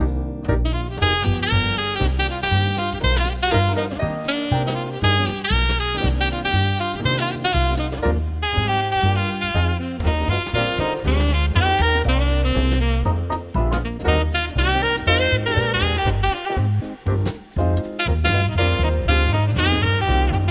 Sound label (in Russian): Музыка для чтения обольщение